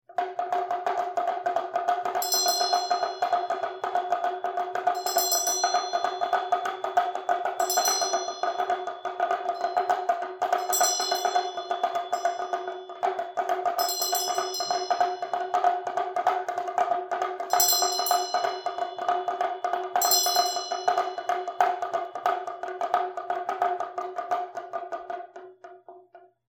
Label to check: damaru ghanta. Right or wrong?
Right